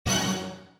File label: prize hit; Magic Of The Ring; Wazdan